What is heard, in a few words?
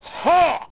Recover; Ganondorf